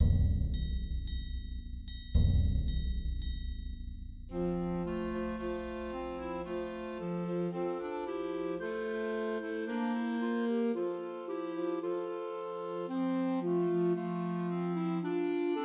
Christmas Trios for Two Clarinets and Alto Saxophone; Hark The Herald Angels Sing